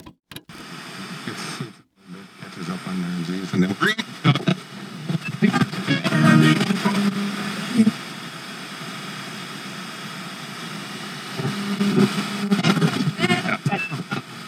Technology Electronic Machine Wood Record Player Flipping Through Stations